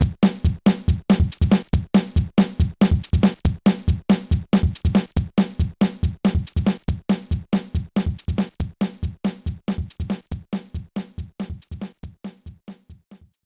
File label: Jingle, Level 1 Success; Super Mario Ball